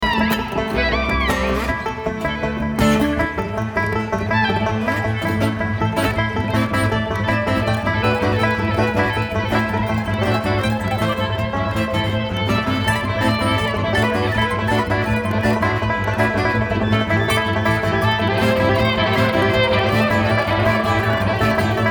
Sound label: Traffic Jam